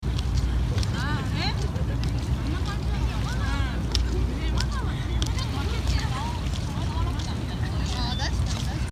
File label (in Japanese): ノイズキャンセリングなし